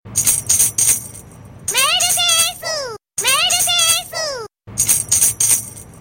Newmail Short; Sumomo

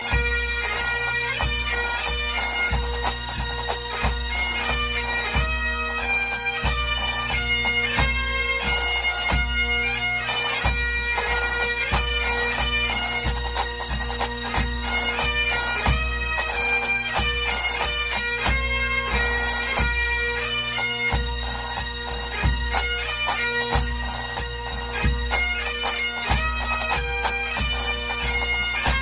Scotland Anthem

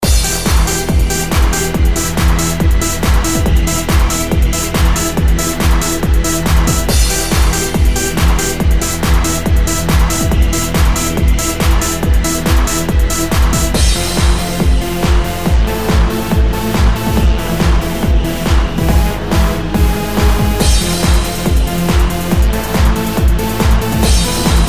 Techno Hard

